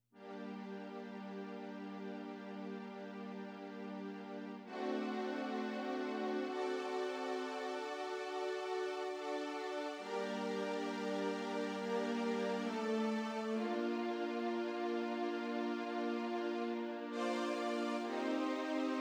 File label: synth